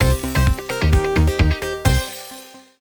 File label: free spin init; Amatic; All Ways Joker